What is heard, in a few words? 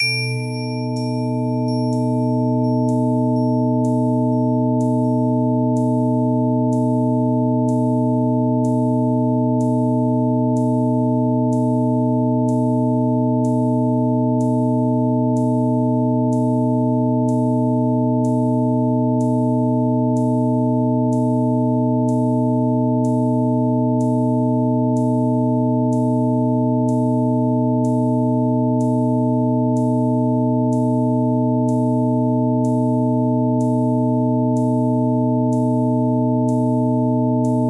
Zen Dominant in B; Mike Cirillo; Musical Self-Actualization